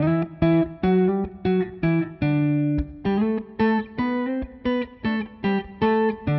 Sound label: guitar